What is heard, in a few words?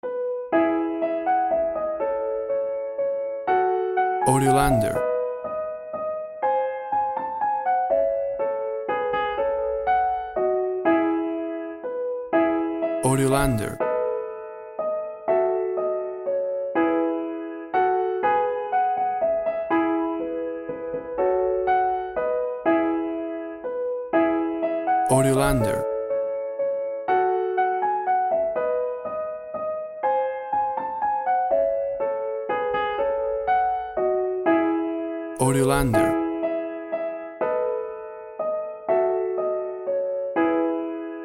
We Wish You a Merry Christmas Piano Version